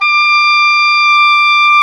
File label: oboe, mf f; Roland L-CDX-03 Disk 1; Oboe 2&3